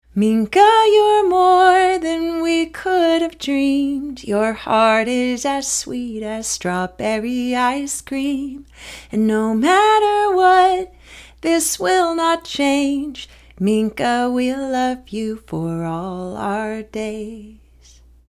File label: Acapella Song Sample; Lovely Lullaby